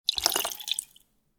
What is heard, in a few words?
Pour drink into a glass with ice